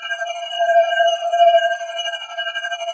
shield on; Tribes2

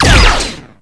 sniper fire, weapons; Tribes2

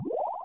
cloak on; Tribes2

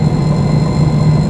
boost; Tribes2; vehicles

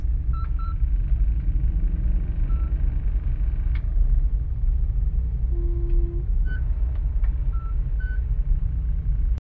loading hum; Tribes2; t2-mapper

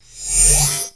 inventory on; Tribes2; t2-mapper

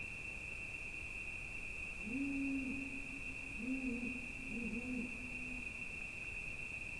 desert owl; environment; Tribes2

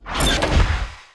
throw Pack; Tribes2

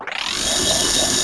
chaingun spinup, weapons; Tribes2

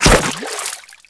cg water; weapons; Tribes2

